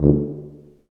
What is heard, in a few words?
BRS TUBA C0, BRS Tuba stacc; Roland L-CDX-03 Disk 2